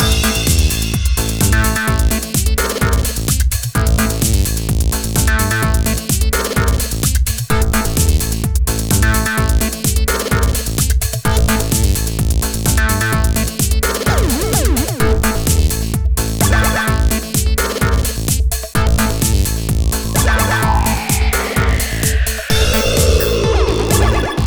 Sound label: Hacking Minigame; lego dimensions soundtrack